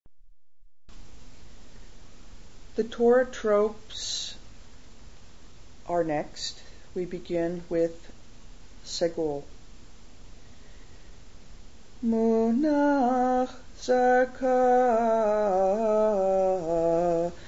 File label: munach zarkah munach segol, Torah Trope